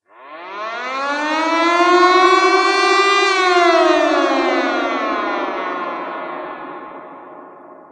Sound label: siren